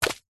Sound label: foley balloon water drop splat; zapsplat